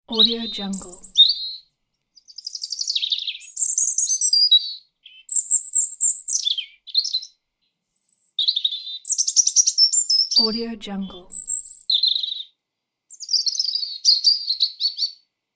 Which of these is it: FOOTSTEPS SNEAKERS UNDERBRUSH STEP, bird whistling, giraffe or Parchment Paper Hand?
bird whistling